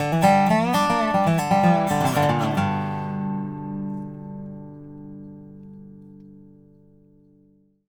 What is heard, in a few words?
DOB LOOPS 120; Country